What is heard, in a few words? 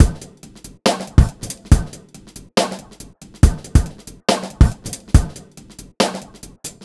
Bpm140 Funky Break, Dubstep, Drum Loops